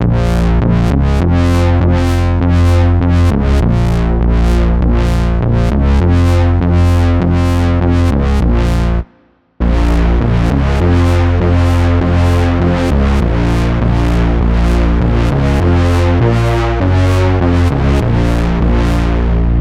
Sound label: Brass Damage; KontaktPlayer